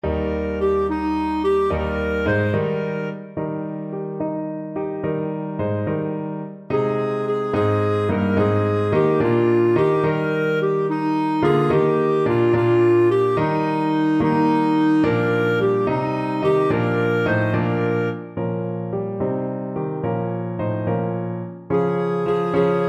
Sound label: christ was born, clarinet